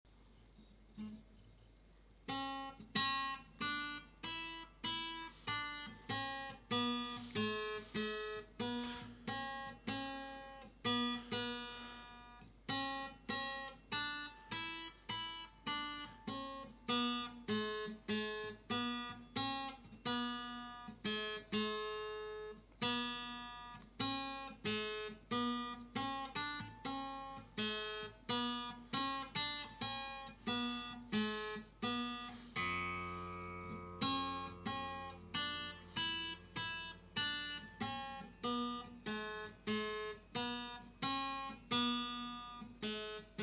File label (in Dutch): Gitaar